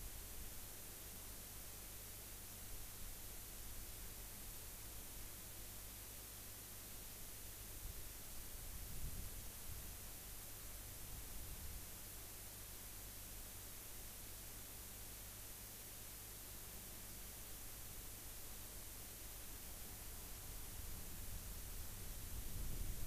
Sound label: rayol farm; SEAMED